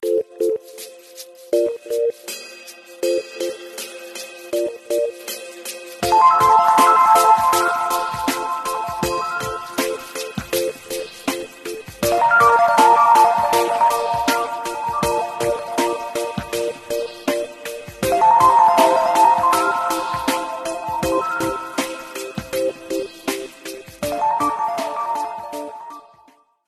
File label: Airy fairy; Coolpad 5860